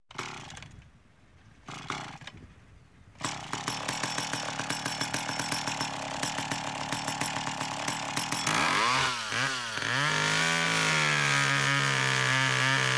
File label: Chainsaw